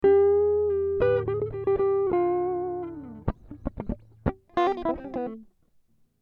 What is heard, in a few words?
Dm 100 GTR Riffs